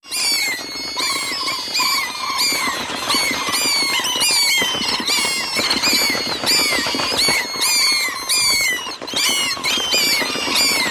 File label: bat sound